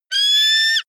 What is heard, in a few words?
eagle call